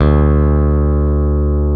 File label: BASSES, Roland